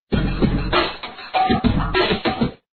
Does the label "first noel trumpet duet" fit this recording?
No